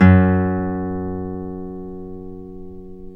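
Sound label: classical guitar, nylon string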